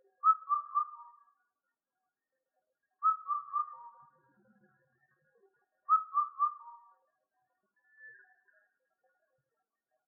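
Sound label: Indian Cuckoo; kokonoe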